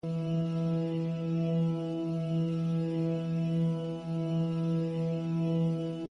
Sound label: Saw Tone; wave interference; wave Meter